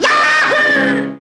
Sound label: HUM YAHOO; AMG - Now CD-ROM (Roland)